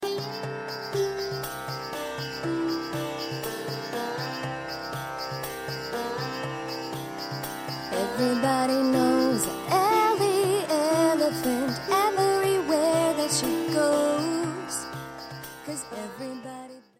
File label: elephant Song; ellie